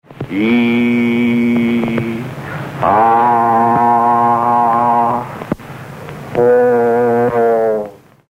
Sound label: mantra; iao